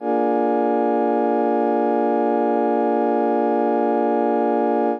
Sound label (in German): akkord b7 f2 hoch